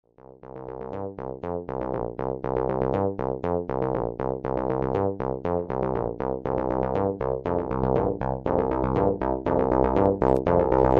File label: Bass Delay EQ